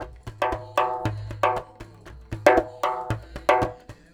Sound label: African Stickydrum, African Percussion; World